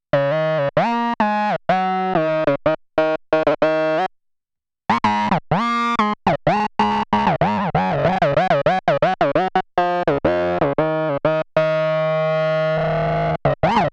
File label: moog squelch